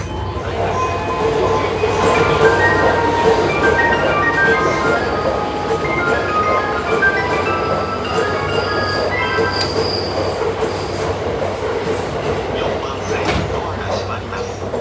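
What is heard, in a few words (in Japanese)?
山手線 新橋 clockwise, train sounds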